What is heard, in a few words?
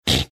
snorting